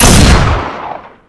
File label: sniper fire